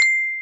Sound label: messenger notification